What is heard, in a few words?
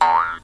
rabbit jump; mob